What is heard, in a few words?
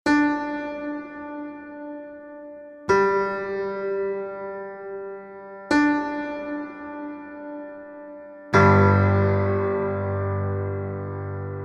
Piano Version